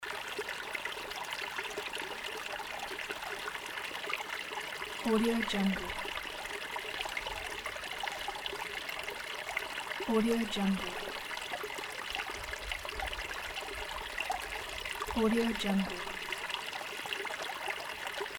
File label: flowing water loop